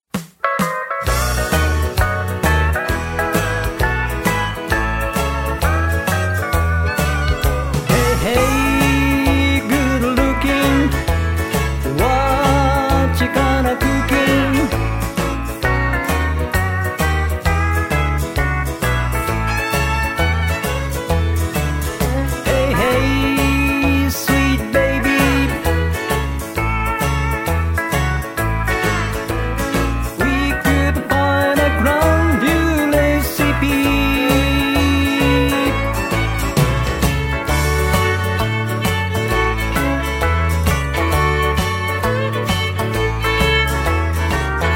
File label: harmony